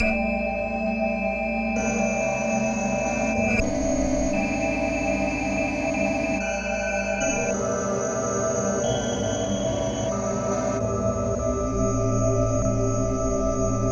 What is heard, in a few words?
Uni Asymmetric Tone